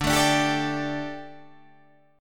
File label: D Suspended 4th D